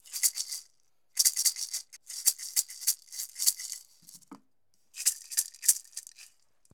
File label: Percussion Native Shaker; SFX Kit; Foley - Percussion